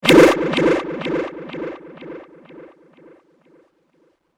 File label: Intense Echo Mage Spell, magic sound effects